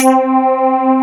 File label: wet bass; Wizoo - Powered DX